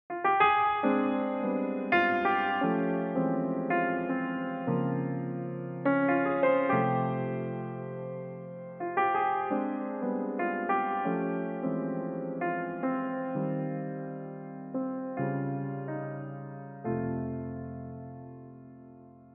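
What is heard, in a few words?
Prelude F minor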